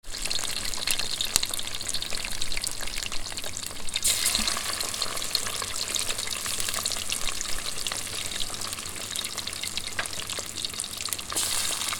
Frying pan pepper and onions crackling sizzling

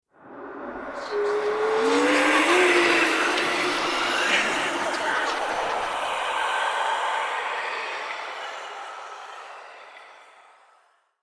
Scary whispers whoosh transition; suspense

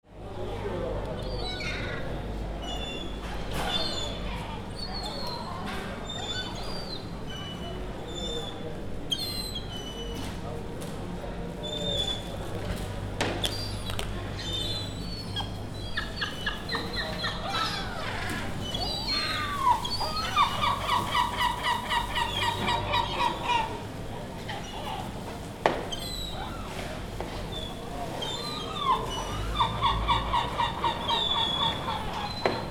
Fish market ambience interior

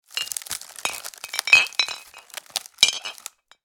Wicker basket movement bottle clinks inside

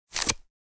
Wallet removing credit card; purse

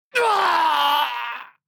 Death shout anime villain vocalization

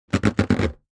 Rubber balloon friction creaks

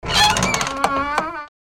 Vampire coffin lid open

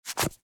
Wallet credit card sliding into slot; purse

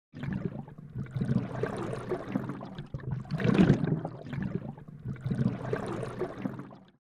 Fish movement underwater perspective

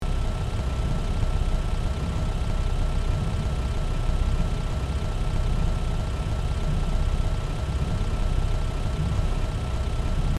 Sports car engine idling loopable

